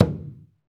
Skin Drums

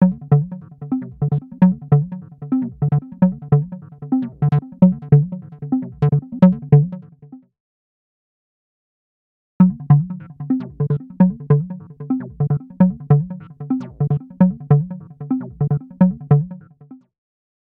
Syn+Bass Loops 150bpm; X-Static Goldmine 5

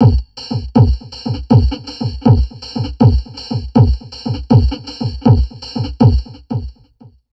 Drum Loops Crazy Processed

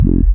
Secrets Bass, Jungle; M8

